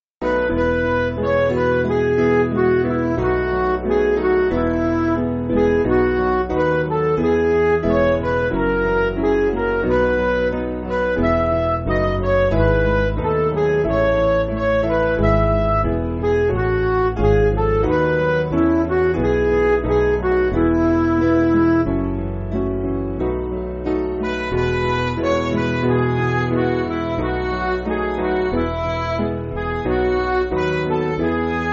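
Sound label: Music Backing Files for Churches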